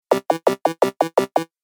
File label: Retro Gaming Sound; Video Game Sound Effects